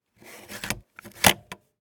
Radio cassette in

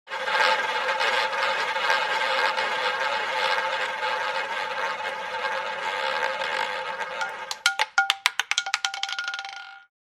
Casino game roulette wheel spin

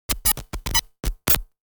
Connectivity malfunction electrical interference; sound design effects

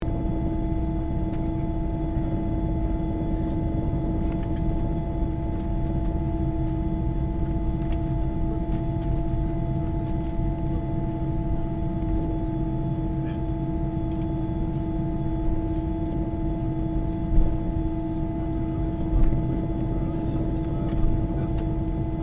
Airplane interior perspective take off; vehicle

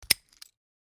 Padlock open and shut; locks keys

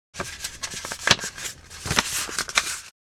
Paper handling folding

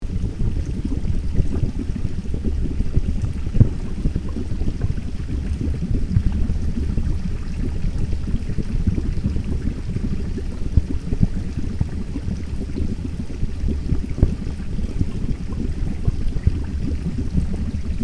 Volcanic lava lake rain ambience; nature

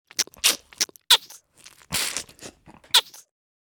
Vampire sucking blood feeding

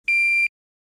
Home office printer button turn on with a beep

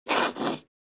Plastic shampoo bottle squeeze

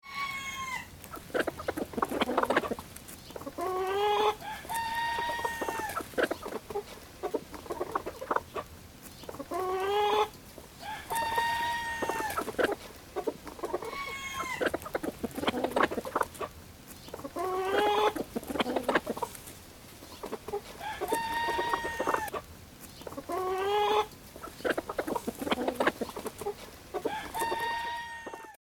Animals farm chickens clucking hens and roosters

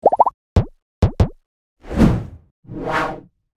Infographic graph build up pops whooshes

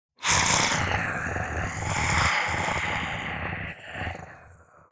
Demon beast imp monster breathing

